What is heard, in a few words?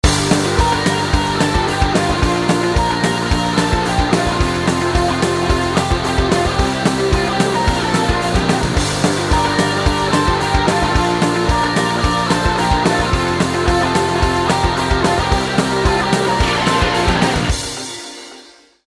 Break